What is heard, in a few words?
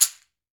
CAXIXI, Latin Shakers